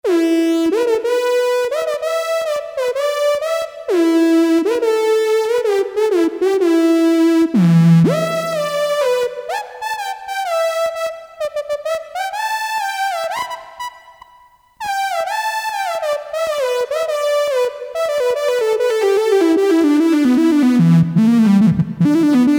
Poli Trumpet + Mono Trumpet, Farfisa Syntorchestra